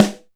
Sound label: Snare, Alesis DM5